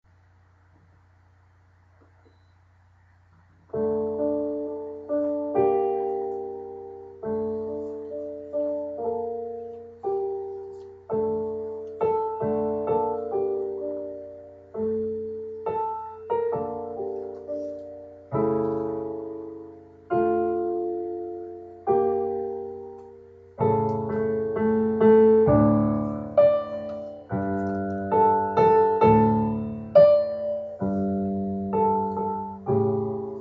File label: Piano